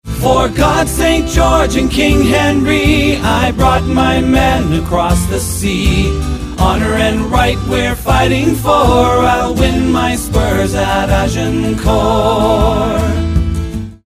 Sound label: Chorus; Agincourt